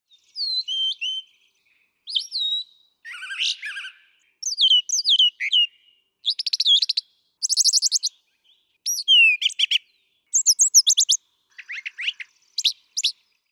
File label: Brown Thrasher